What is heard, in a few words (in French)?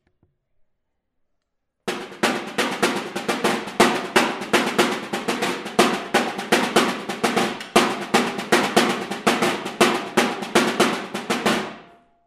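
caisse, instruments de la musique traditionnelle; Caïxa; Il s'agit également d'un instrument de la BATERIA; Non, je n'ai pas éternué ! Il s'agit également d'un instrument de la BATERIA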